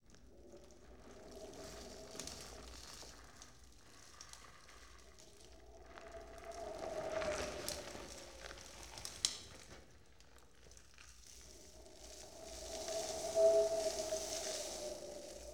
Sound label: Wind Foley Sweetener With Debris; Sony Pictures Sound Effects Series Volumes 1-10; Sony Creative Software